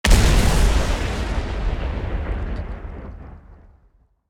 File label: heavy ground explosion layer